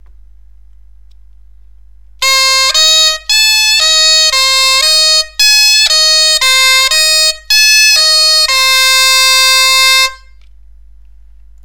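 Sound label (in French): Arpège entier do mi la naturel, bombarde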